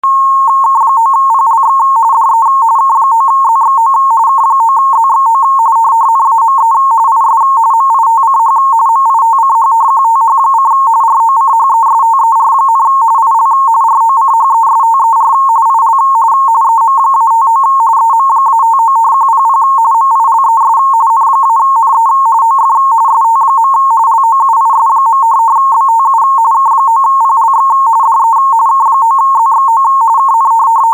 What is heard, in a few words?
rtty